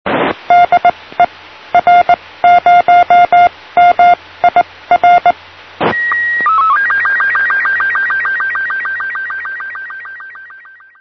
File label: sstv; mir